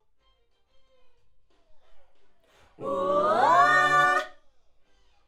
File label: Schoeps AKG, piano